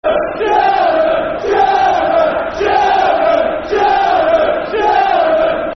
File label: Cheltenham, Football Chant; Soccer Songs; Cheltenham Town Ctfc